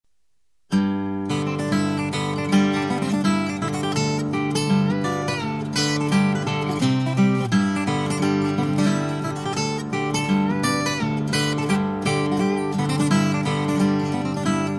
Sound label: acoustic; the boys of blue hill